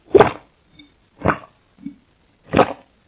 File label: drinking female